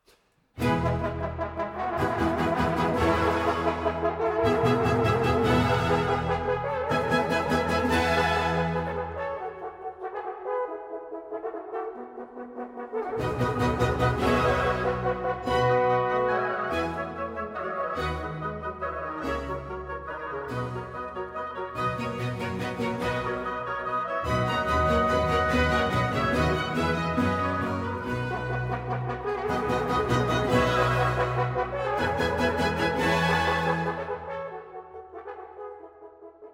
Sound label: Water Music Allegro da capo; collegium1704